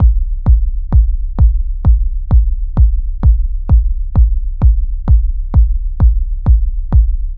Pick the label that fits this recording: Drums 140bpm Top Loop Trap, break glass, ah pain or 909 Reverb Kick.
909 Reverb Kick